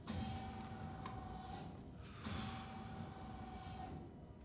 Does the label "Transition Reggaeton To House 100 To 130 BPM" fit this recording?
No